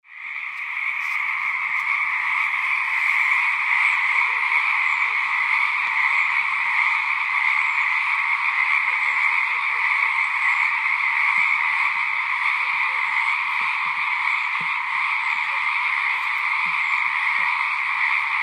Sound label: Frogs Singing